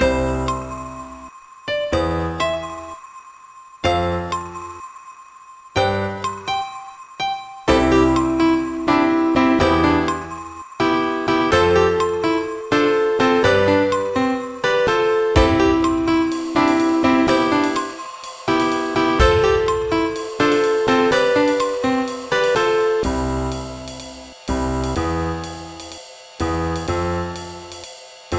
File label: mod happy piano; Fog; Trackers; Modland